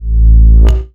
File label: power up